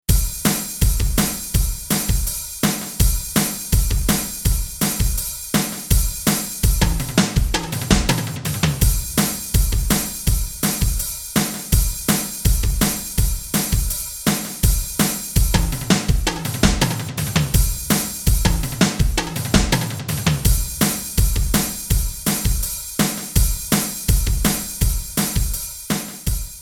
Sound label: Rock Beat